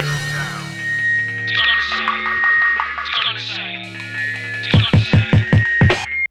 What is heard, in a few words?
Hardcore Breakbeat, 152 Dmin; Big Fish Audio; Hardcore Breakbeat Action; Gone Insane